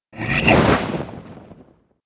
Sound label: archmage attack; propack